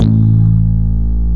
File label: DIGI BASS; DIGI BS